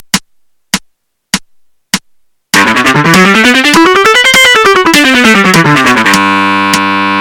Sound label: legato exercise, guitar